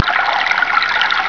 natura nature

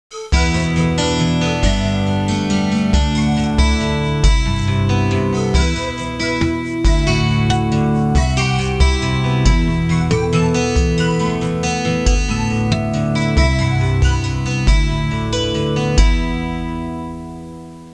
suonerie musica hawaiian

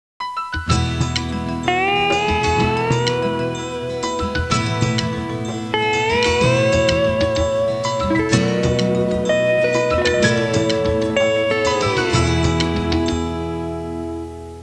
suonerie musica hawaiian

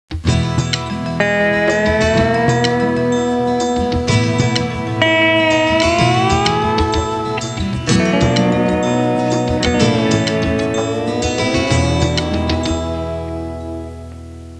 suonerie musica hawaiian

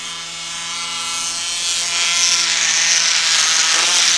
WEED WHA; casa

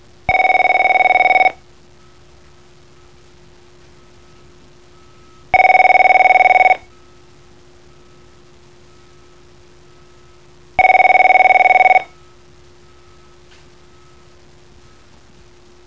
suonerie businessy

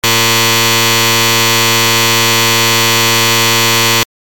suoneria beep

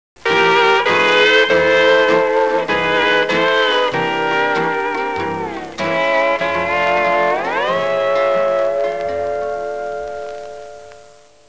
suonerie musica hawaiian

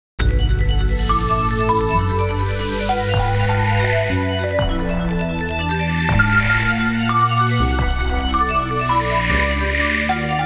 musica elettronica

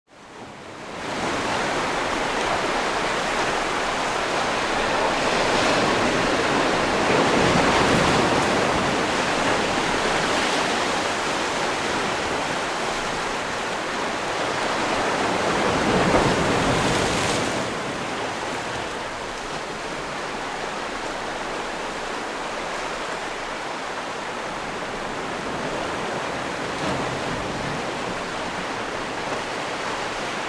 acqua water, natura

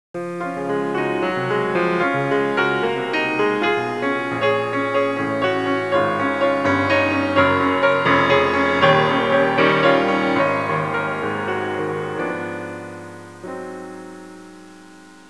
suonerie classica; musica classical

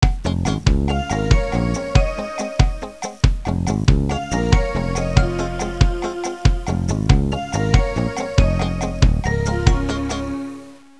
suonerie contemporanea; musica contemporary

